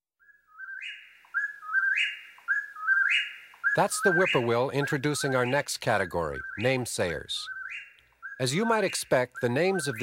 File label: Name-sayers- Whip-poor-will, Chuck-will's-widow, Chickadees, Phoebe, Wood-Pewee, Killde; Bobwhite; Birding By Ear